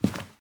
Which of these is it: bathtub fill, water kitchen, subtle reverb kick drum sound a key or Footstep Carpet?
Footstep Carpet